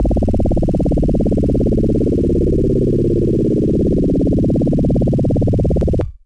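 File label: Electric Bass DX100 C#1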